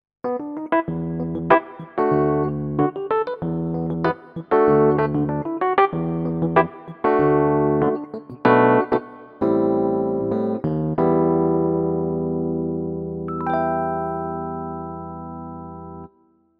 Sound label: CP40 E Piano